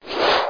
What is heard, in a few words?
CANCEL; ｳﾙﾄﾗﾏﾝとえいごではなそうデモ